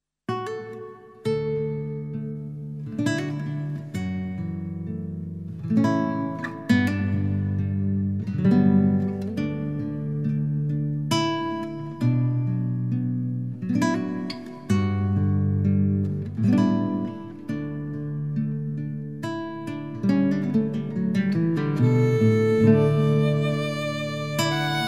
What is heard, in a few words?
Violin